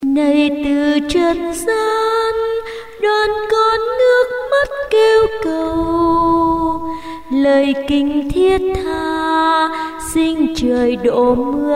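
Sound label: soprano tenor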